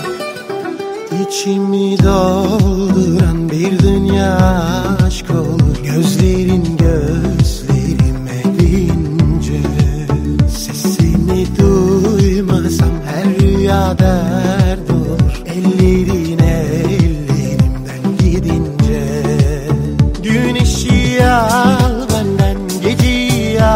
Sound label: beautiful music